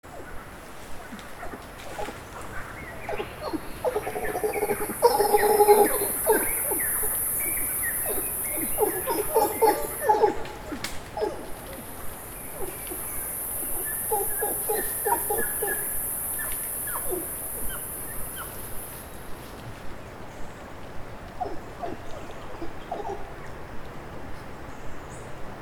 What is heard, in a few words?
mantled howler alouatta palliata